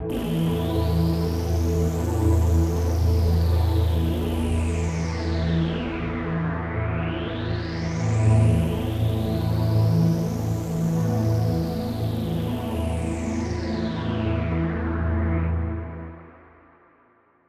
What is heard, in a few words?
Dark Synth, cinematic drama soundbeds; musicradar